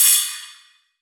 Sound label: Titan Ride, Dubstep, Cymbals; Rides; Drums; One Shots; Cymatics; Dubstep Starter Pack